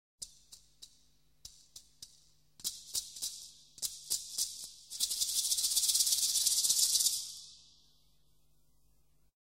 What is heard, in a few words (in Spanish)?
Maracas